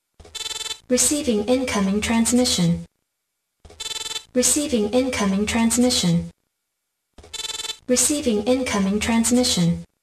incoming transmission; starcraft

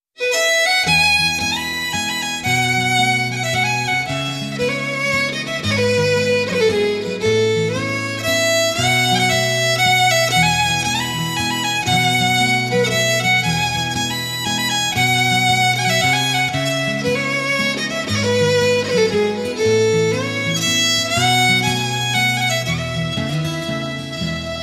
lullaby

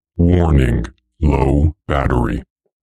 warning low battery

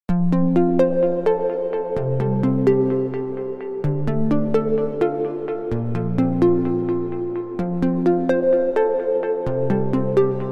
nokia lumia tone

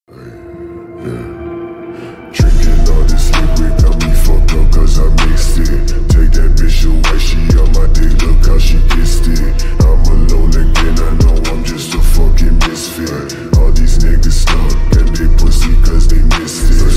slowed reverb

